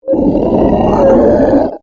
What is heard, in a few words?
Monster Groan, Horror, Monster, Groan, Creature; Monsters (Sound Effects:Horror); BlastWave